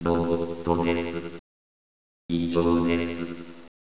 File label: robot